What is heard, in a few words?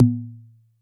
Electric Tom, Yamaha DTXpress